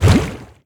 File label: tar in; Crypt of the NecroDancer